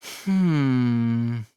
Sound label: hmmm